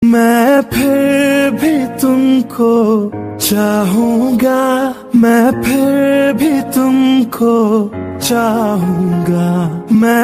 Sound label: sad ringtone